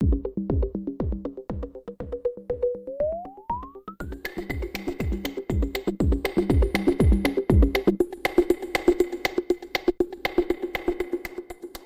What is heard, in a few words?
drums